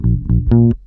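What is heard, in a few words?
bell, Roots Disco; USB Soundscan vol.32; AKAI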